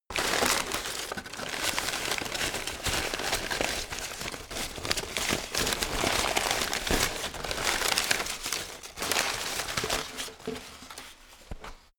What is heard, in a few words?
paper rustle